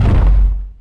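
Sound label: wood hit large; objects; Star Wars Obi-Wan; XBOX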